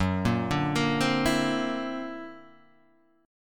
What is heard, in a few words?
D Augmented 9th F sharp, guitar